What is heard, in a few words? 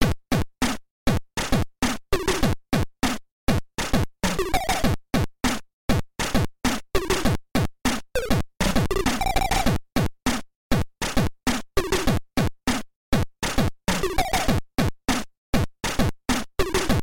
The Alarm; Rescue Heroes Fire Frenzy